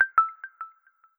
Windows Default Notification

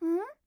system notification; Windows; Madobe Ai

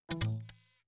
Windows Hardware Remove

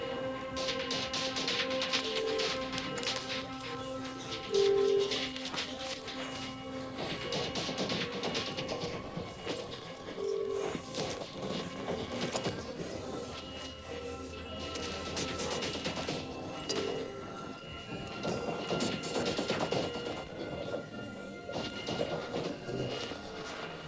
Racing Against Time Music

